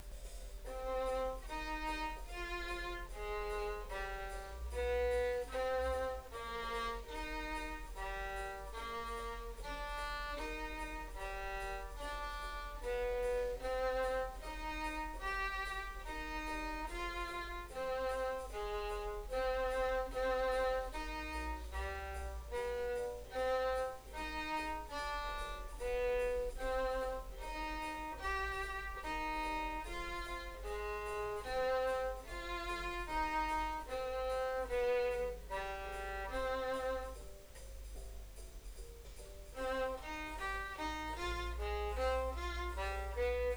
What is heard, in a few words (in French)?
basse au violon